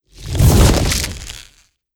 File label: Ice Solid